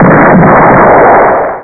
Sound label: lander die; sc2